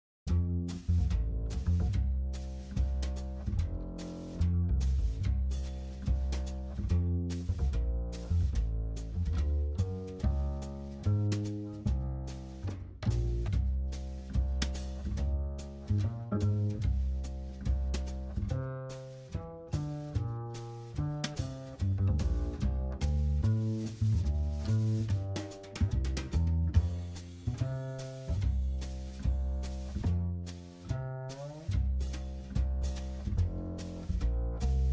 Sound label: Backing, jazz